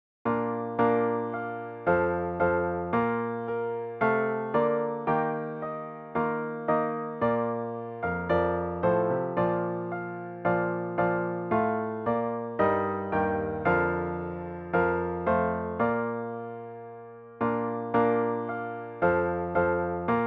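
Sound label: The Spirit of God piano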